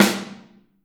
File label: SN OH L, Kit OH Mic, Drums Percussion